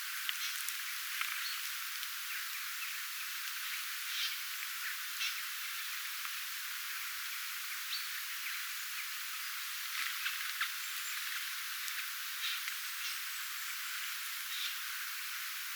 erikoisia jarripeippolinnun aania

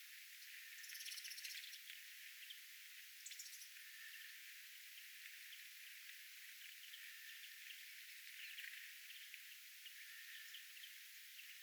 vahan rauhallista pikkulintujen aantelya saaren linturuokinnalla

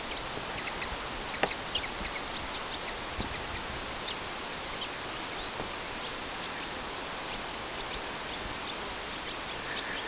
hemppo haarapaaskynen

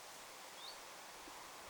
tiltalttilintu

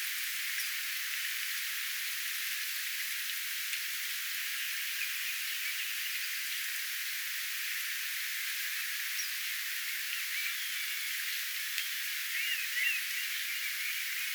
mahdollinen pikkupajusirkun kutsuaani kaksi kappaletta; voisiko olla pikkupajusirkku?

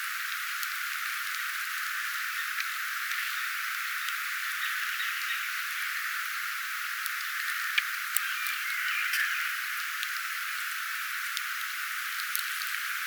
vahasen kalatiiran aantelya; kalatiira ääntelee

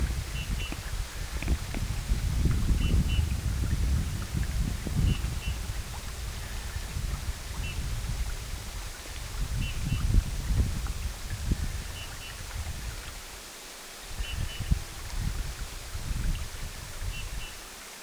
hieman sarahtavaa punatulkun aantelya

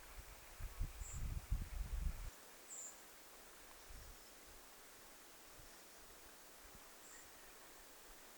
punakylkirastas

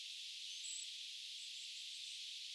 onko hippiaislintu

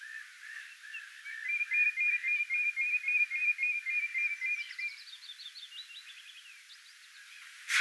ilmeisesti punajalkaviklon sita vahan liromaista laulua

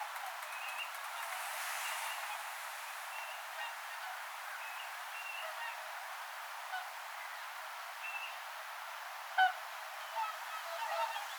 tyllilintujen aantelya rannalla

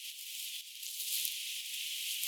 tundrasirrin lentoaani

